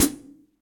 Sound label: Hihat Brush Foot Club Sizzle; Drum Hits; Standard Hits; Ableton; Factory Packs; Session Drums Club